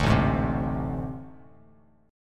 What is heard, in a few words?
A Minor Major 7th Double Flat 5th, down, Bass Standard; strummed; JGuitar